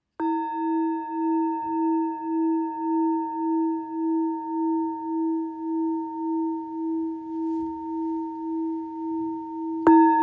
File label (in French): GRAVE 593 gr 145mm 325htz mi